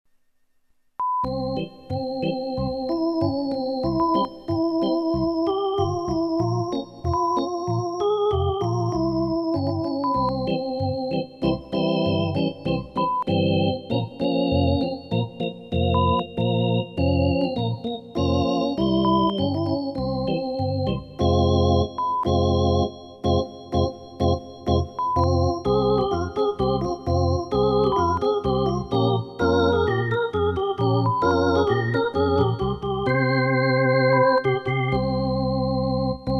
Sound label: baseball Music